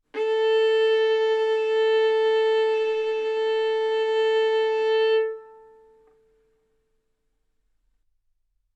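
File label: Violin arco mf A string A4 B4 stereo; sul A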